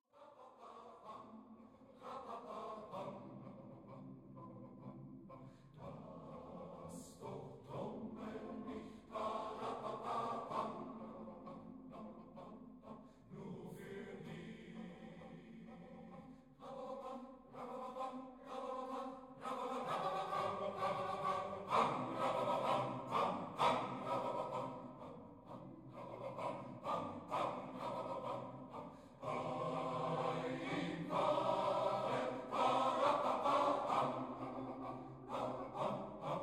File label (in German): trommellied